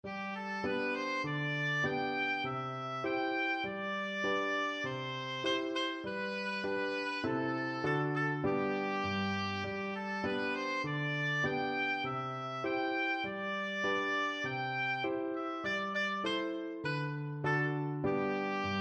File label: teapot, oboe